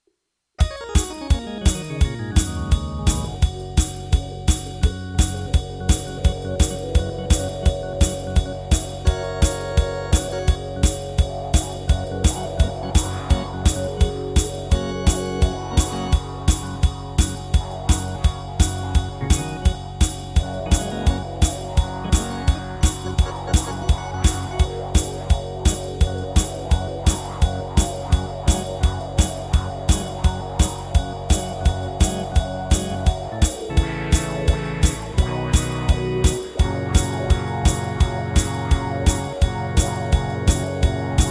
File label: Instrumental